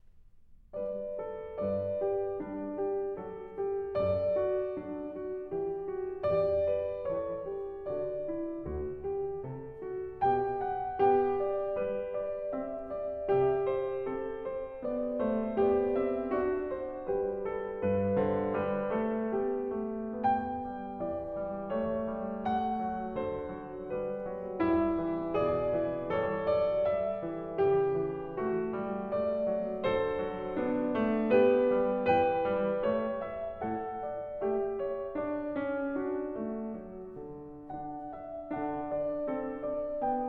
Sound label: Bach Goldberg Variations BWV988 Variation 19 - Allegro Vivace